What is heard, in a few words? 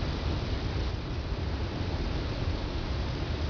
wind heavy